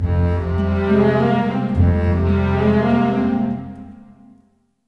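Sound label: 108 start, orchestra; East West; Scoring Tools; Start Story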